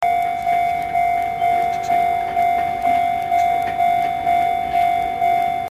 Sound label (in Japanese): densya